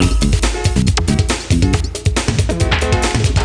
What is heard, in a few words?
Spicy Salsa